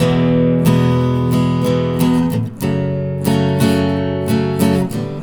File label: Strummed, Acoustic Guitars; ACID MUSIC